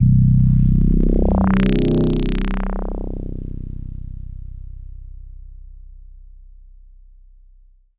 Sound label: Korg DS 8 Rezo Bass C3